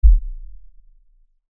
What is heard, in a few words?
LFE Ultra LFE Hit, Lfe Ultra Low Bass Hit Impact; Deep Tone; Sound Design